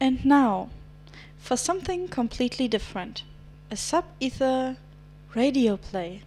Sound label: radioplay jingle